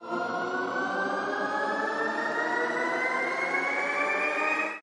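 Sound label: respin sound; longpao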